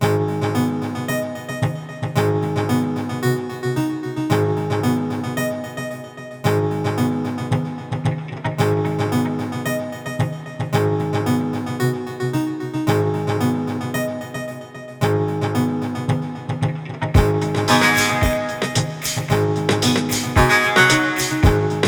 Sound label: Ice Cave Loop